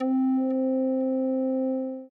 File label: string